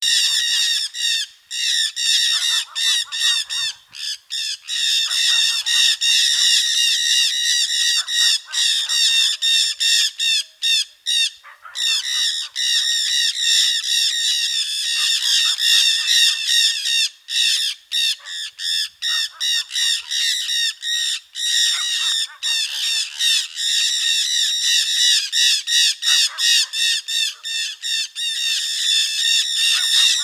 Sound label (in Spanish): Aratinga testadorada